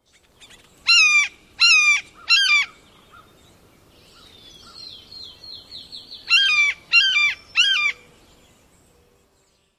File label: red-shouldered hawk imitation; NY